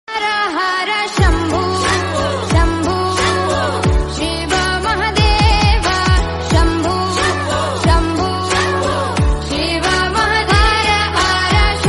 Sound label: Female Version